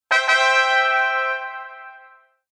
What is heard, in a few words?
Electro, notifications; BlindShell; BlindShell-Classic-2